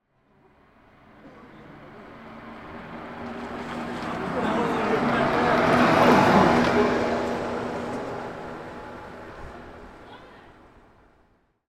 transport golf buggy pass road people chatter onboard